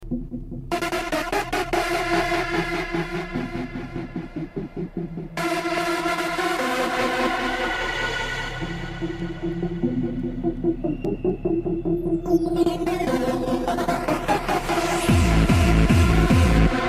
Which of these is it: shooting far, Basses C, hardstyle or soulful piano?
hardstyle